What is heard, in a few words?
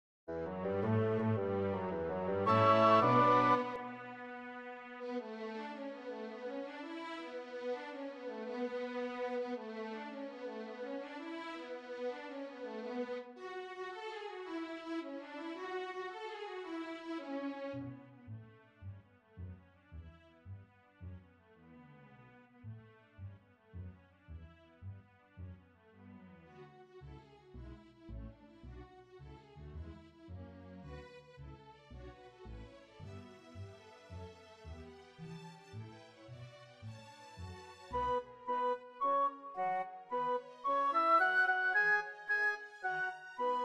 Jig